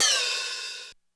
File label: HiHatOp, Korg DSS-1